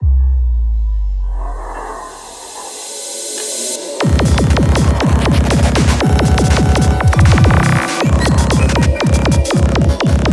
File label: Funk 250 bpm